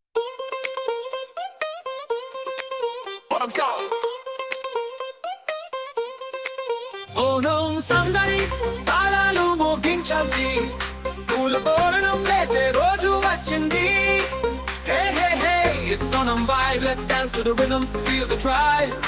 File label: Onam BGM Ringtone; K-RAMP